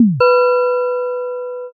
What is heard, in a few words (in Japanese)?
shishiodoshi